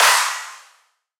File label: Riddim Snare Clap